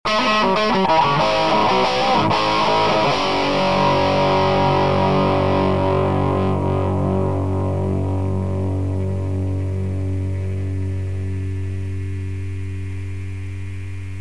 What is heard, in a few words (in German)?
angezerrt; elektro